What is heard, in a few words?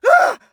hit; Escape from Tarkov; scav4